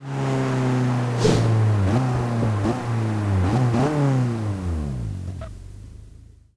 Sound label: throttle off, vehicles; gmod; tdmcars; mx5